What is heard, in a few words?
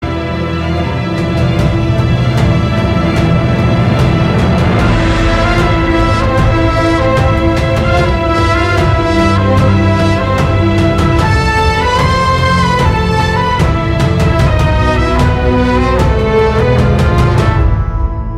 Theme BGM; Karnan